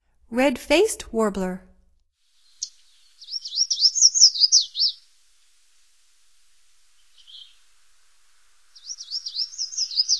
Red-faced Warbler, Songs of Birds of North America; Stokes